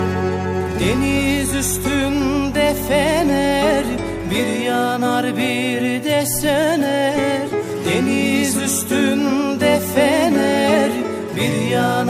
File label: Turkish Slow